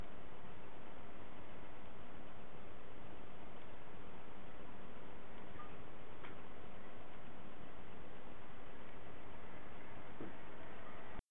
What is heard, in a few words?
Kids Sounds